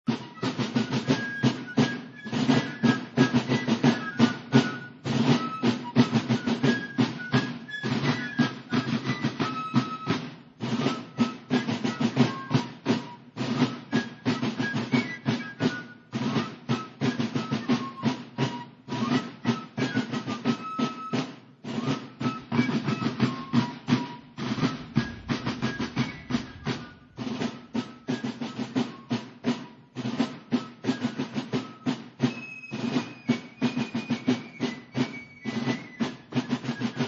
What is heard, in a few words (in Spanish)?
Marcha Ingenieros pifanos